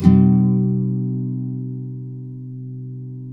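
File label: nylon up minor C#, guitars, flam chords; Spectrasonics; Hans Zimmer Guitars VOL-2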